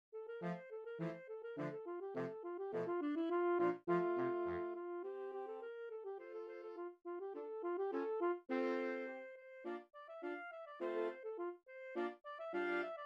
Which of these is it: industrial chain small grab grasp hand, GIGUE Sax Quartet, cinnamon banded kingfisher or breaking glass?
GIGUE Sax Quartet